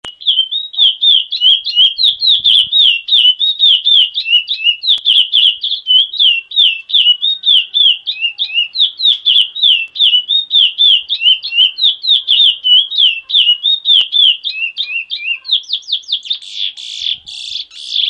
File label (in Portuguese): canto cerejeiras